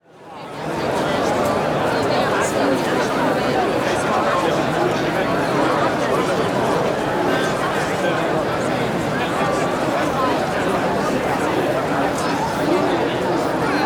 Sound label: Crowd Outdoor Busy Dense Gathering, Walla; Sound Ideas; Walla Series